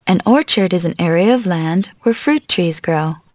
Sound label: orch